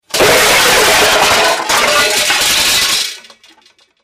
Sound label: Large Crash Huge Cras